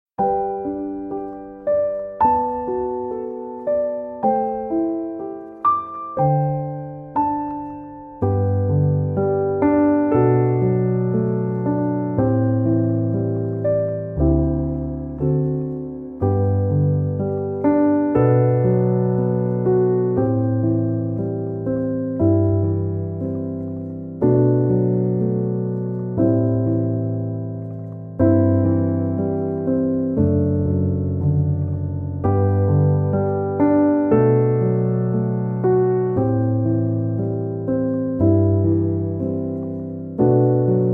Piano Instrumental

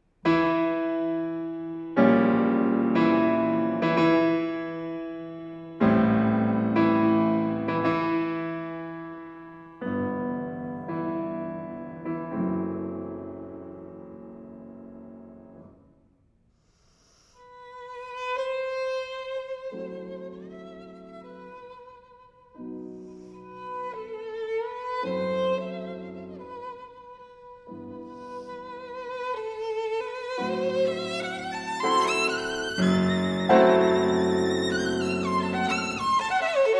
Violin and Piano; Eugene Onegin, Op. 24, TH 5 Lensky's Aria; Arr. Auer

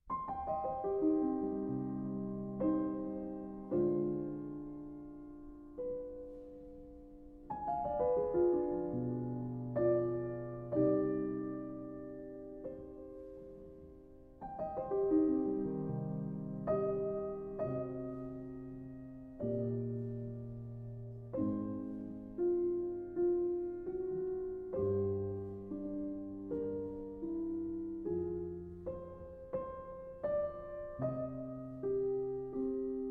Piano Sonata No. 34 in C Minor, Larghetto

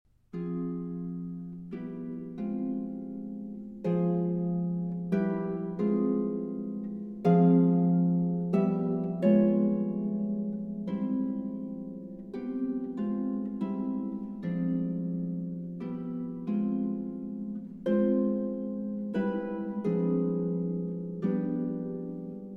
Suite For Harp, Op. 270 III. Andante; Hovhaness

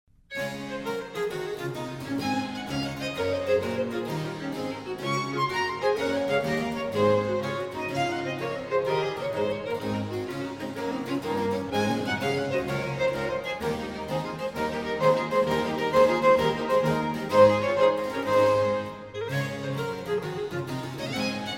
Canon and Gigue In D Gigue

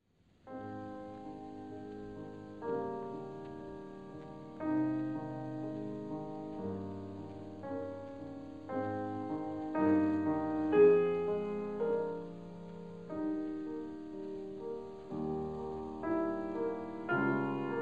Piano Sonata in C Minor, Adagio cantabile; Piano Sonata No. 8; Op. 13; Pathétique II.

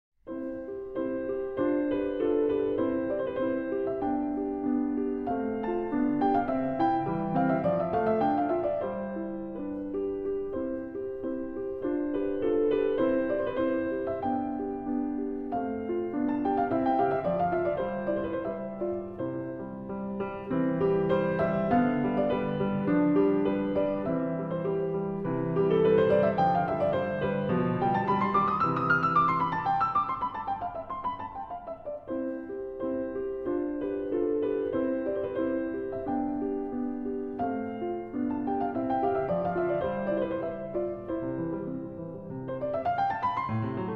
Rondo in C Major

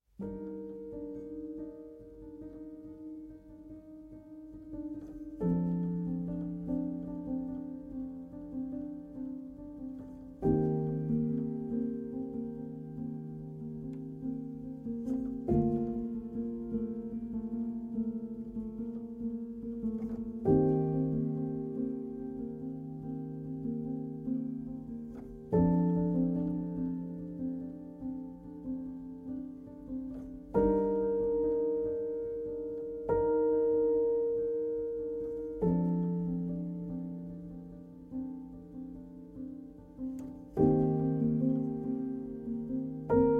solo piano

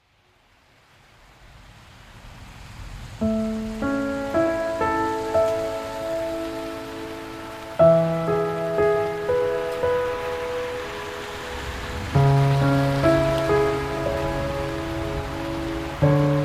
Piano and Rain

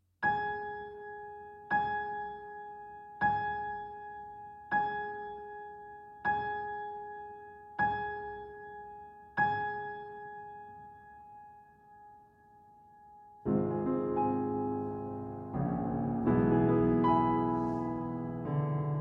Piano Duet; La Belle et la Bête; III. Le Dîner

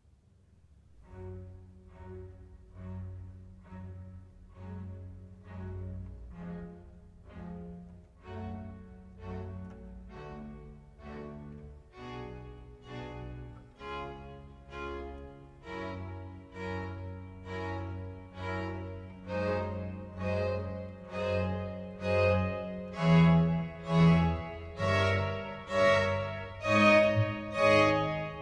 Concerto in G Minor For 2 Violins and Cello; 12 Concertos, Op. 3 -L'estro Armonico - Concerto No. 2; RV 578

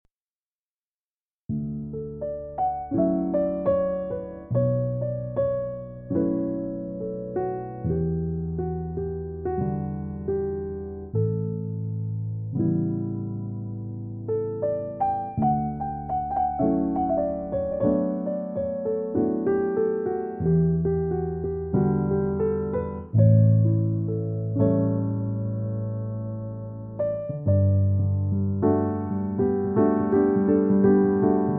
Piano

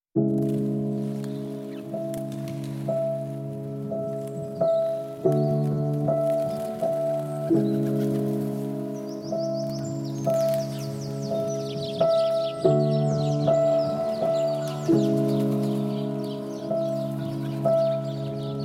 Piano and Wings

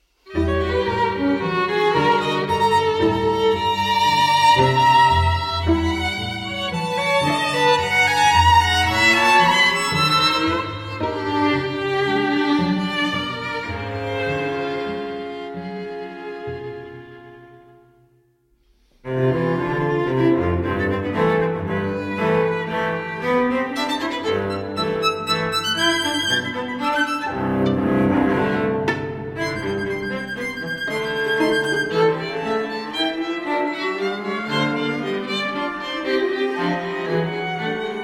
String Quartet No. 1; Rørsla I. Rørsla

